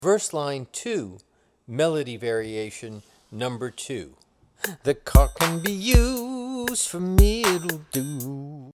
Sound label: Verse Line Melody Variation